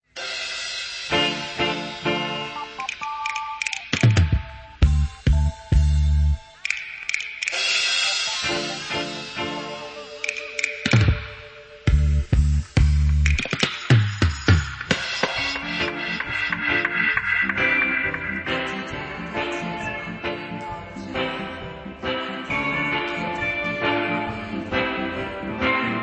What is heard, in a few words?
Sound Africa Dub